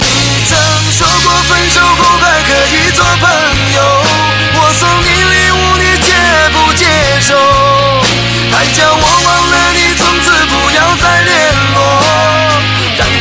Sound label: Chinese Song